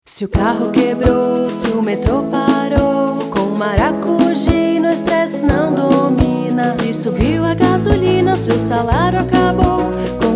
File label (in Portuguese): Jingle; Maracugina